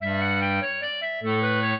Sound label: clarinet; Mozart